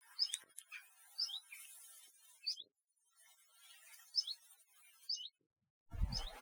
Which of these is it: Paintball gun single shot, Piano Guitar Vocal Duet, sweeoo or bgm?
sweeoo